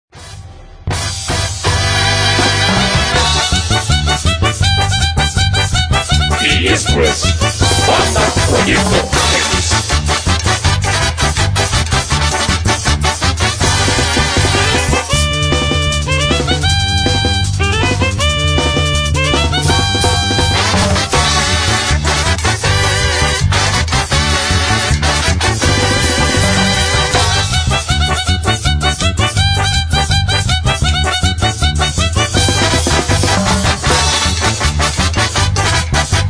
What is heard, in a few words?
violin